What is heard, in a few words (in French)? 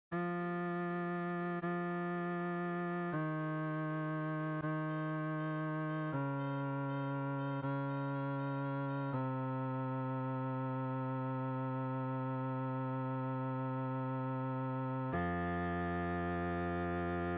basse; Si ca vous chante; lettre Q; lentement noire= 120